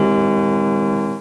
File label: Lost Last Life; Barrack Unity